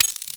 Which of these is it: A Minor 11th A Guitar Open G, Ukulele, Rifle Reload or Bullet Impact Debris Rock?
Rifle Reload